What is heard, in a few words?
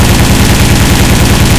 breda safat 127 loop, guns; War Thunder